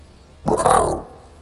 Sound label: Minmi, dinosaur roars